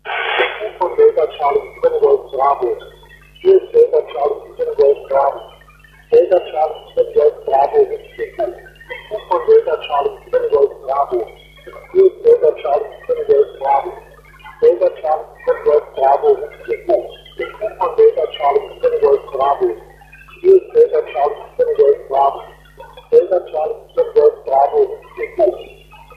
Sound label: FM -126dBm; bhi; SN-Vergleich